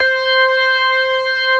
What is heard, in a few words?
STOPS, Synth and Organ